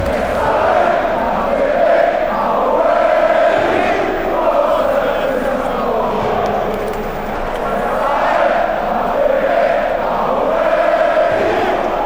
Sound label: tralalala, fan chants; pauli